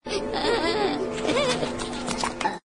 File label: minion cry